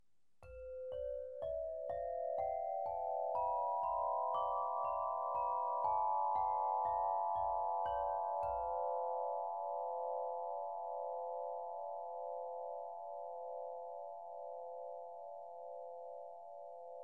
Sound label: Ethereal C 432hz Crystal Harp; Harfa krysztalowa Eteryczne C